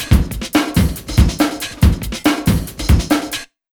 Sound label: Big Beat Drumloops; RUN 140; USB Soundscan vol.25; AKAI